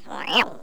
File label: 16-bit Jupiterian Beep